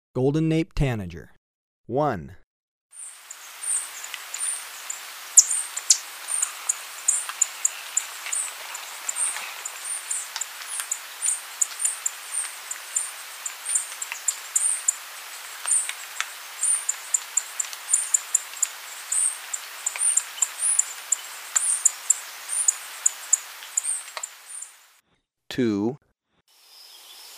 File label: Golden-naped Tanager, Birds of Ecuador; S. America